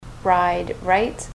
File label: ride right